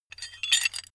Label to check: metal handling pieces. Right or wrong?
Right